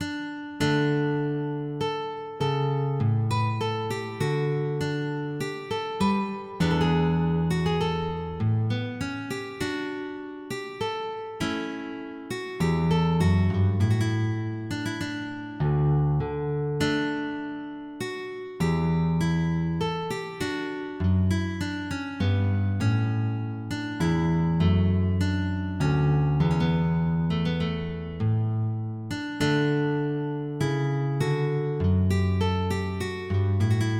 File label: Courante; de Mesangeau